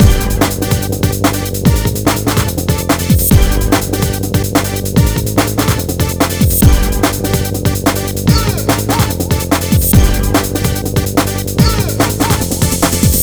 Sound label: Uprising, 145 Gmin, Hardcore Breakbeat; Big Fish Audio; Hardcore Breakbeat Action